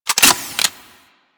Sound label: Heat Gun Reload; Grineer